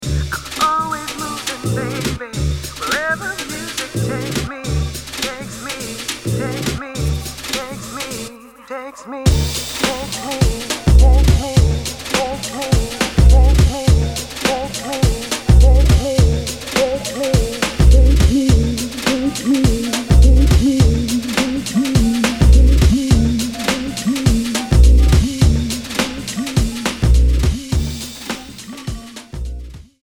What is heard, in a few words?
dub club mix